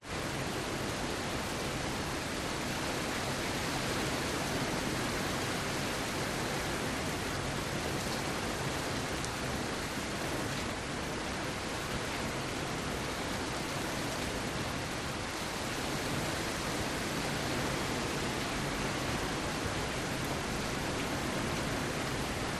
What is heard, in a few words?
nature rain internal home rec open window occ cars pass low thunder rumbles; zapsplat